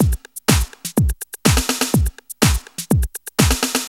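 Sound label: drum loops, right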